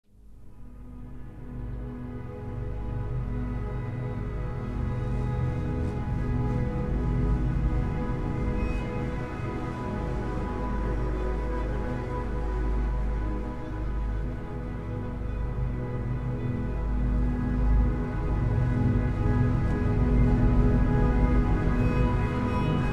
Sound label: Chords